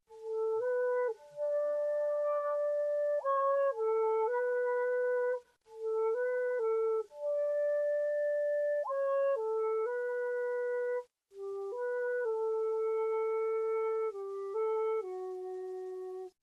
simple flute

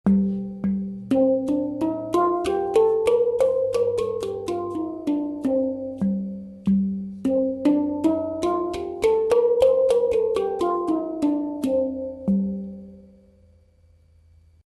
Rast, hang

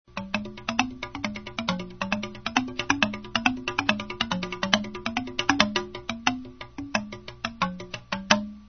boobams

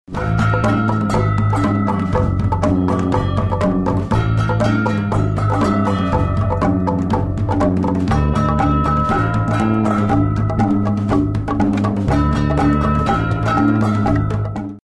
aquaggaswack

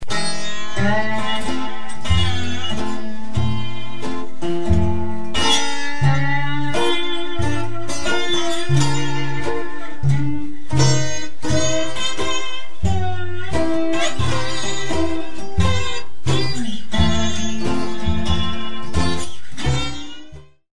sheet metal band

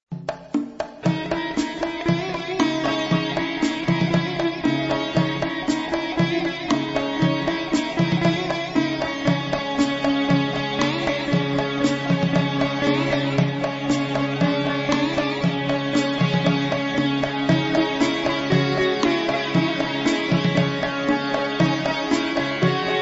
e-sitar

